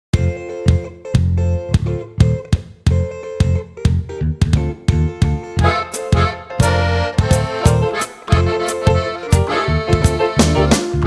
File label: Zydeco Jump